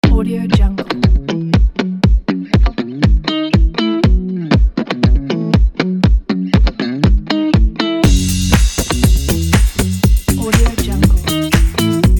funk

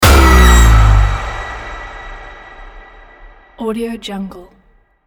scary horror stinger

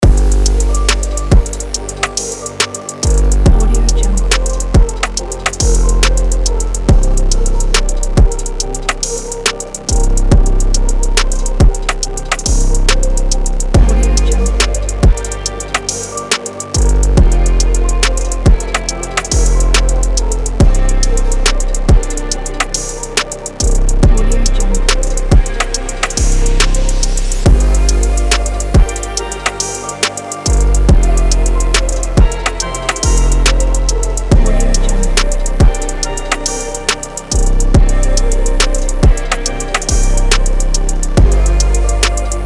hiphop is on

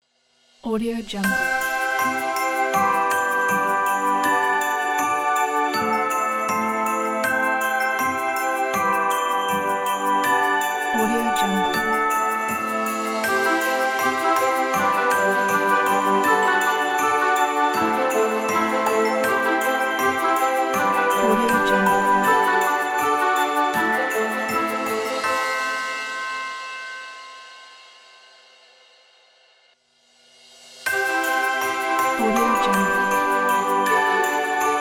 the christmas logo

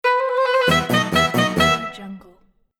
game over polka

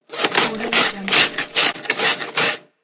cut cabbage

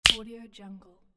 snap of fingers

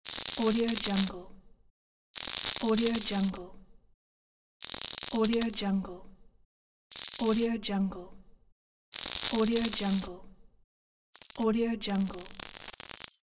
electricity

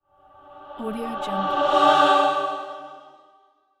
discomfiture dramatic chorus

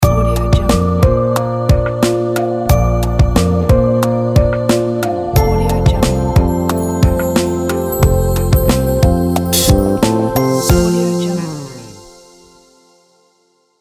positive logo

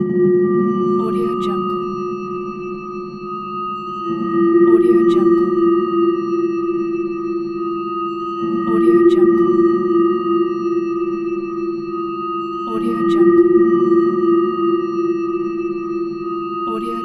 fear atmosphere

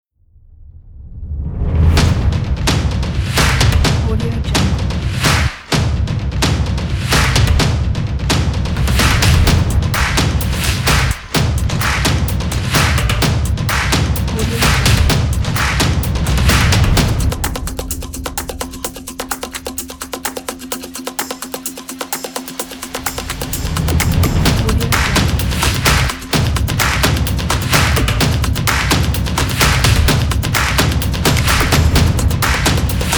that stomp

